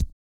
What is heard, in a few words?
Vocal, Blues & Soul; ILIO; Vocal Planet VOL-2